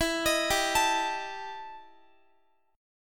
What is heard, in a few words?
E Minor Major 11th E Guitar; EmM11 arpeggiated